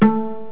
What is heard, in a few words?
pluck